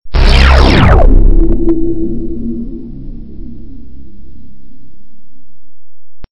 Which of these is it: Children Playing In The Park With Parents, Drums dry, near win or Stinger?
Stinger